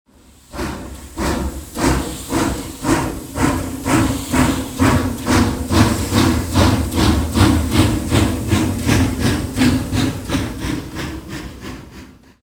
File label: train accel; Soldier Of Fortune II Double Helix; XBOX; ambience